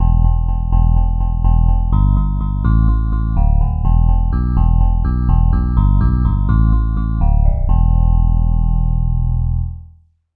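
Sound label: electric piano bass; legend ep 2; SpectMorph Evaluation #4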